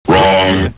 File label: wrong